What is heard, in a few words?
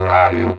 DIGERI SCRM; Proteus3